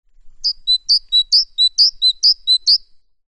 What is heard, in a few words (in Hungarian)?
szencinege